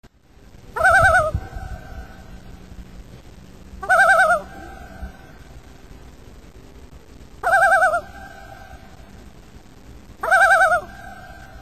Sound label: loon tremolo; loon calls